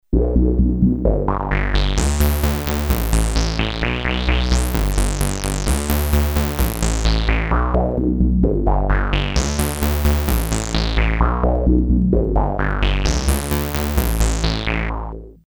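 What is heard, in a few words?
bass fat, Polivoks duophonic synthesizer; Polivoks formanta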